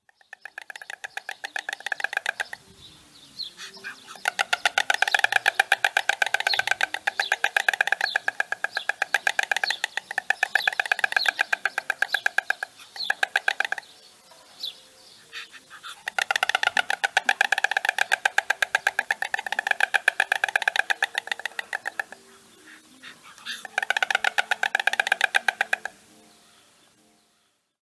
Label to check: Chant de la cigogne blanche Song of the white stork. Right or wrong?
Right